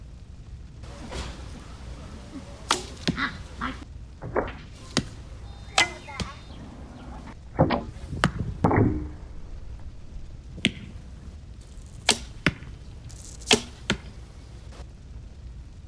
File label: bow hit; weapons; Dungeons Of Kharadum